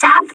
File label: tap; npc; metropolice